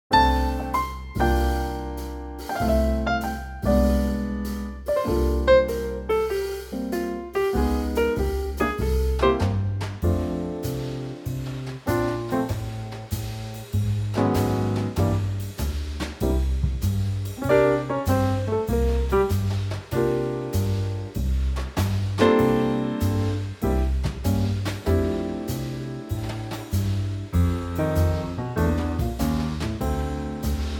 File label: Trio F